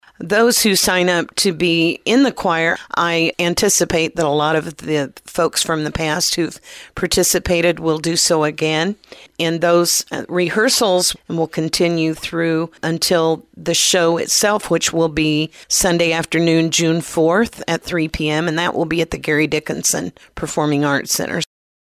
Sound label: Choir